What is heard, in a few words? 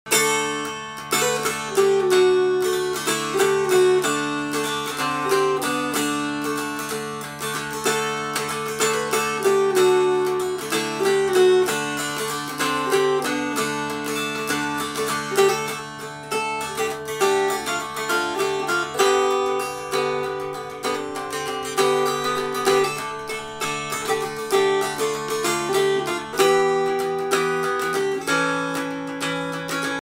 Appalachian dulcimer